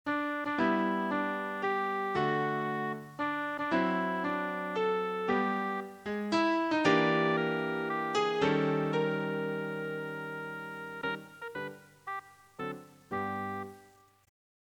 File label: happy b